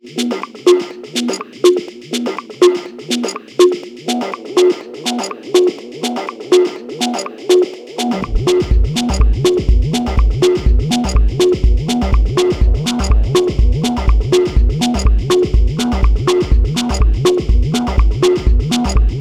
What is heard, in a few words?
TB-3, Digitone + OT techno; the black sheep of the 303 family but secretly the most fun one